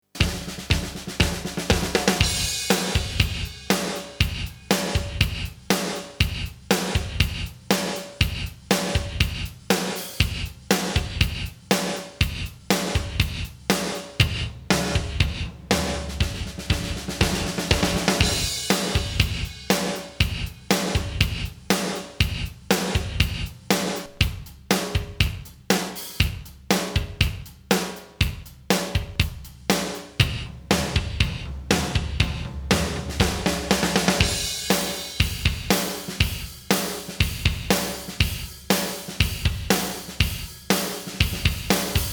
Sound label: Lexicon Model200 Inverse Room Drums